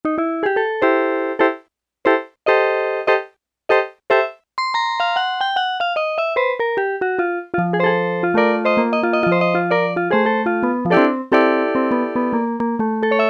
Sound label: PIANO, Yamaha SHS-10